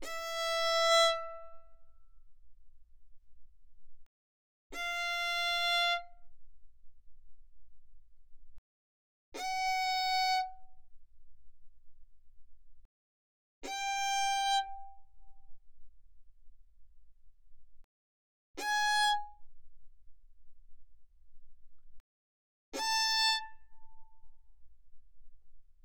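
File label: Violin arco fortissimo sul E E5 B5; Strings; violin2012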